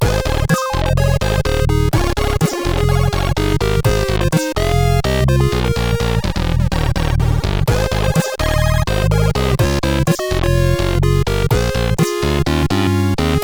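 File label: MOS 8580 SID chip music, games; Mazinger Z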